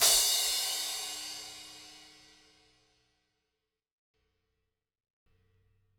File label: CRASH, Drum Kits